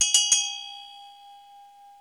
TIBETAN, TIBETAN BELLS; Crypton - DrumBase5000

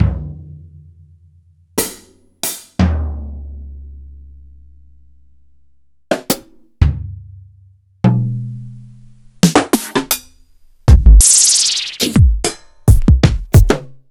EMU PX-7; m8

